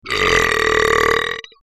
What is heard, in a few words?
brap